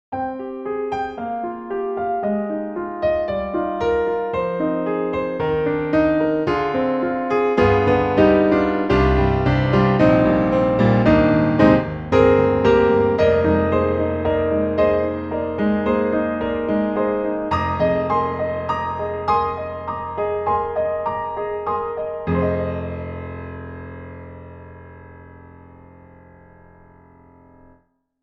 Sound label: Piano Natural; Cinesamples; CinePiano